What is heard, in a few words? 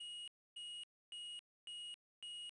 Sharp Buzzy Repeating Alarm Tone Metal Detector